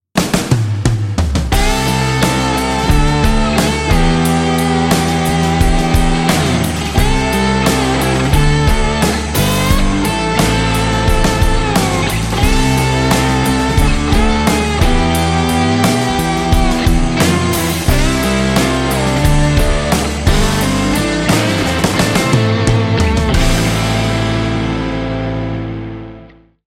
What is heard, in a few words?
Promo Logo Blues; blues intros